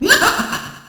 laugh; jevil

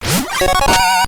shovel victory

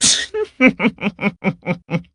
pukuku; ronove

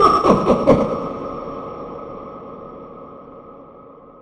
chuckle

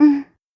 mmm

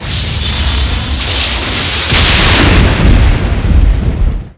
Explosion; Tager; King

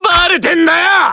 slash; terumi

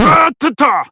oof; jubei

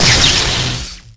blast; gunvolt

